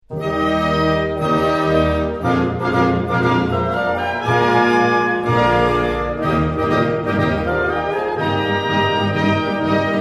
Concerto pour deux Clarinettes Allegro, blasorchester